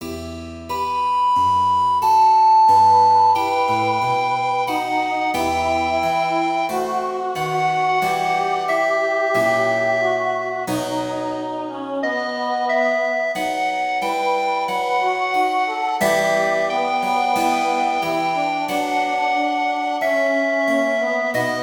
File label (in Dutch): Klaglied zonder tenor; Buxtehude Dietrich